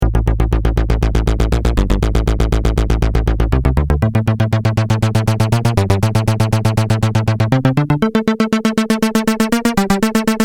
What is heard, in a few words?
synth